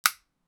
ak47 single shoot

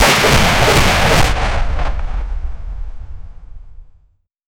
cannon shot

